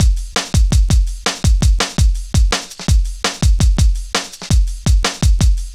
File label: Drum Loops, Jungle, 166.5